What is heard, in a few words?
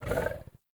Wolf Land Heavy, Vocals; Animals; Actions